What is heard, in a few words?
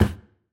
Wall